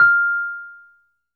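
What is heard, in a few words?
Wurlitzer electric piano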